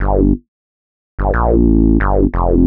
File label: Bassline 90 G, hiphop, 90bpm, Bass; musicradar